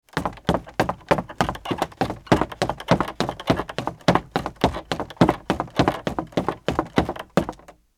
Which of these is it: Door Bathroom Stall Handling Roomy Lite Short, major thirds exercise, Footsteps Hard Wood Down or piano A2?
Footsteps Hard Wood Down